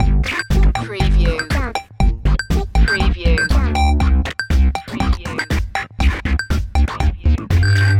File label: indian call centre loop